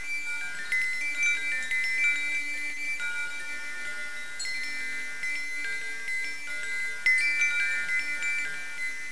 wind chimes

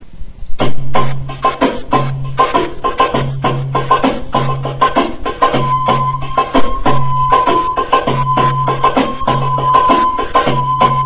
drums

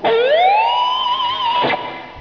scream

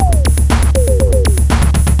electro 120 fill

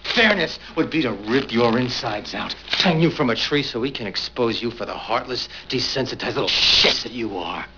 scream

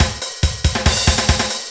train fill 140

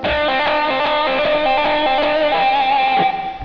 gargle, Guitar Gargle, Guitar Whammy; guitar's body

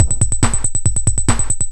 techno 140

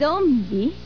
zombie

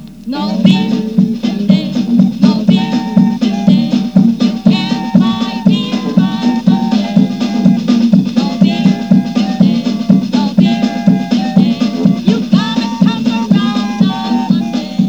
polka

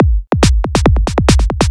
club 140

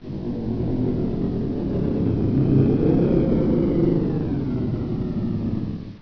Spooky

